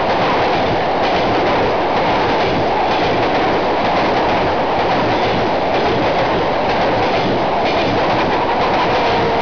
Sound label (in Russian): kolesa, vehicle